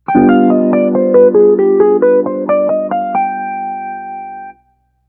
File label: Dominant 5 minor